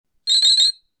not cricket, blackberry bold 9700

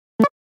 dock, nothing phone

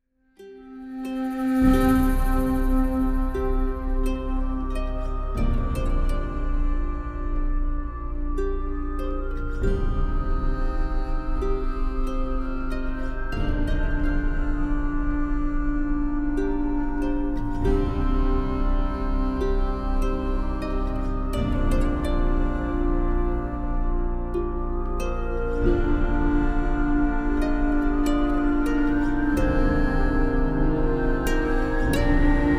western

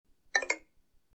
notifier; blackberry bold 9700

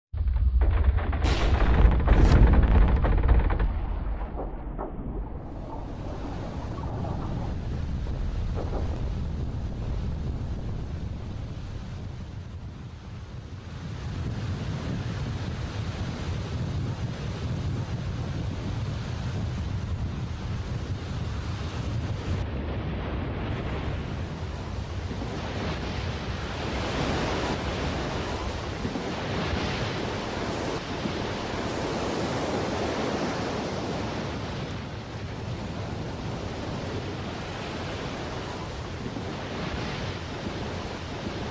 tsunami

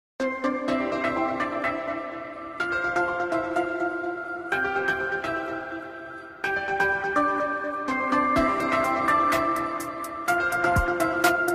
go beyond electronic

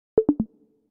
low battery

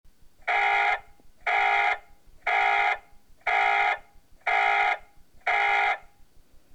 alarm; blackberry bold 9700

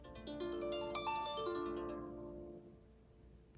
harp

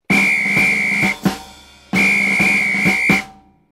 parade, smeshnye mulitiashnye zvuki

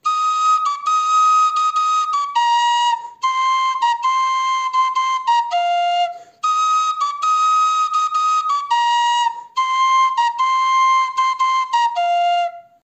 sopilka

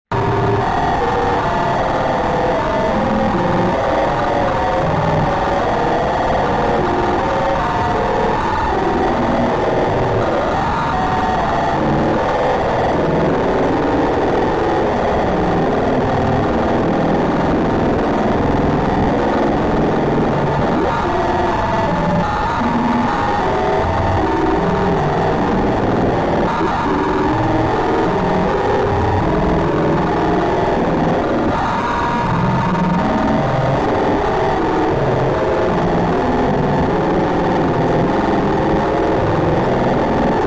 rush ambient; doors

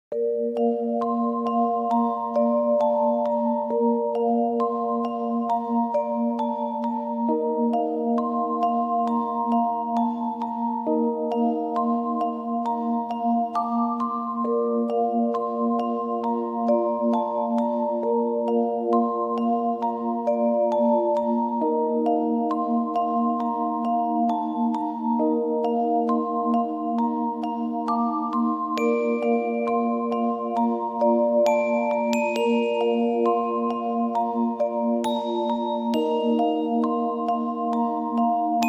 magic, volshebnaia muzyka dlia fona bez slov

